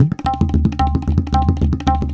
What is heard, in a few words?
tabla funk 110